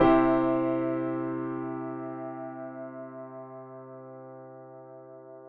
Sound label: Chord Progression Completed